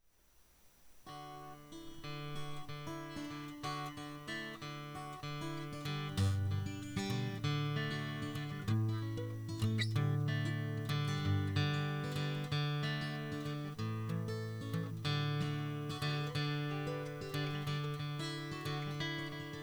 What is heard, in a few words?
Guitar Sound Example